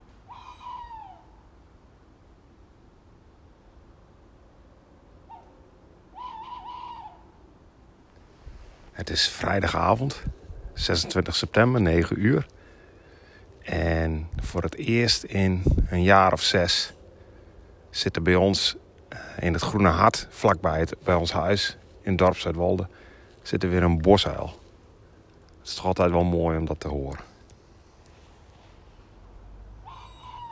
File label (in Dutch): Bosuil